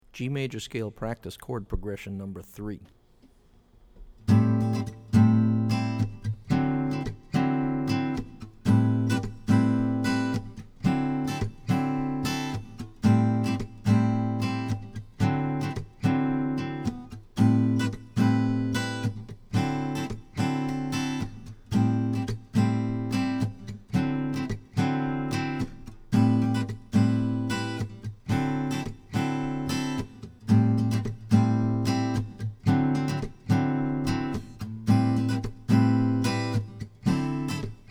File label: G Maj Scale Prctce Chrd Prog; lead skills materials